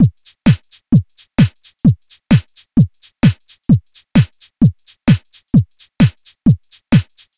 loop 130; Electro; alternative; Reference beat bpm137

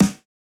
disco snare; Electro

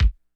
disco kick; Electro

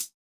hihat; Electro; alternative

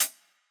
japanese pop hihat

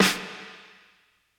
80s reverb snare

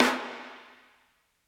80s reverb snare; Acoustic

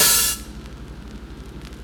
drumbreak open; Hiphop

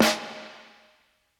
80s reverb snare

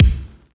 drumbreak kick

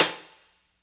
drumbreak hihat dry; Hiphop

